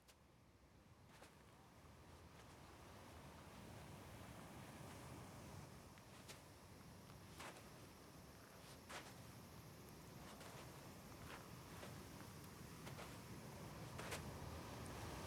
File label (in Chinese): 与你一同走在君之浜上（君之浜海滩环境声。玩沙子）